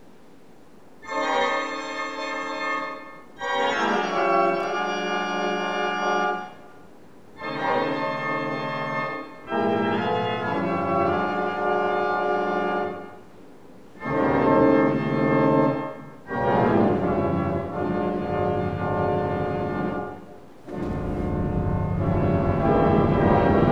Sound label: All Organs